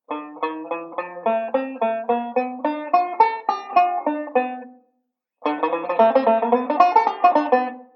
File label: ii V Pattern, banjo